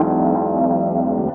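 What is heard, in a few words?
KEYS loop L, Drum & Bass 175 BPM; Ueberschall; Drum & Bass Flash Trackz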